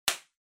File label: Bubble Wrap; 気泡緩衝材; 梱包材